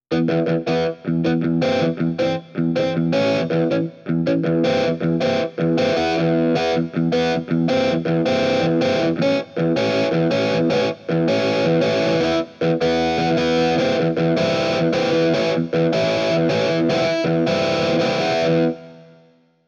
son eguitar, korg m50

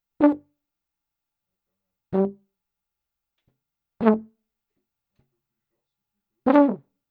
baryton; audiotechnica ae2500

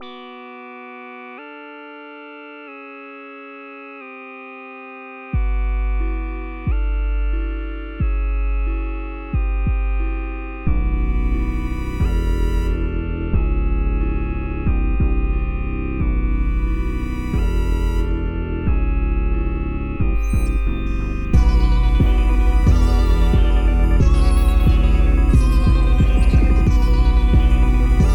Un synth parmi les synths; wayoutware timewarp 2600

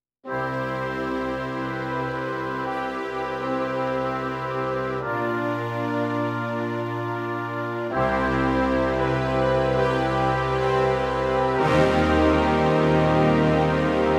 son mix combi orchestral, korg m50

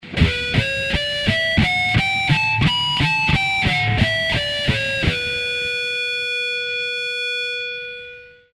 mode si dorien, guitare harmoniques

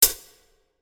1 seconde, reverberation acoustique; waves

